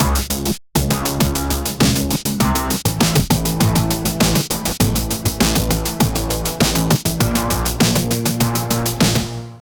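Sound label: drum and bass melodramatic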